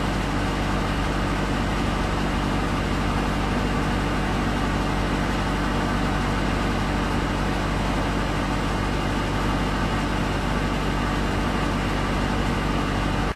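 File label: Ambient Generator Loop; silent hill homecoming 2008 gamerip pc ps3 x360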